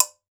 GANKOKWE, African Drum Percussion; Drums&Percussion; E-MU Producer Series Vol. 7 – Old World Instruments

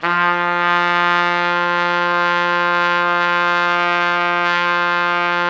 F2, Pop Brass; Best Service ProSamples vol.19; AKAI

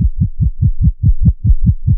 Korg, FX

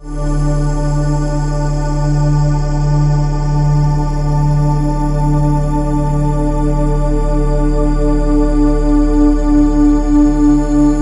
D TURN, SYNTH SND, Ominous Drones; USB Soundscan vol.48; AKAI

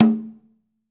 DRUM, Ritual Drums; E-MU Producer Series Vol. 7 – Old World Instruments; Drums&Percussion

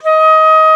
FLT FL D#5, Flutes; Roland LCDP04; 90 s Sample CDs; C Flutes 3-8; C Flt Sh 6&7

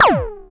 FX A, ANALOG; Sound & Vision - Gigapack II

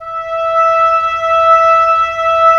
Oboe; Roland - LA Composer vol. 4

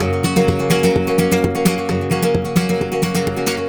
130 F# Rumba; Spanish And Gypsy Traditions; Soundscan vol.59; AKAI

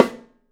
Studio Snare

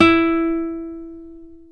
Guitar Classical E4, Nylon String; Roland LCDP02 Guitar and Bass

